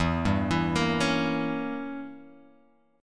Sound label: E Diminished 7th E, Guitar Open G